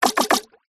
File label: game designed water hit thump